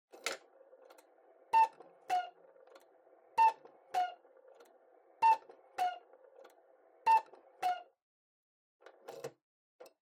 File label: Cuckoo Clock Mid